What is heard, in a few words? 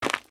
Cave Footstep; Devour; The Inn; devour 2021 gamerip pc macos